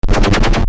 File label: burst fire